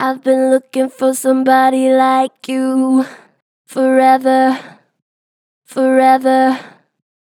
Str Flat Ive been looking for somebody, Vocals, Pitch Flat Strong